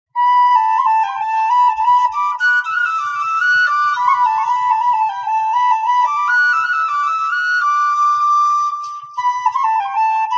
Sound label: Whistle